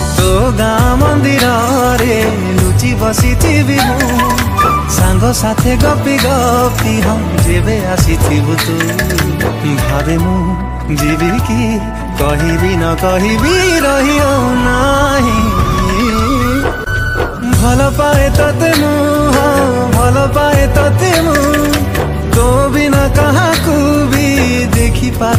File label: Odia New Ringtones